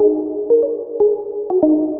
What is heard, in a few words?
grass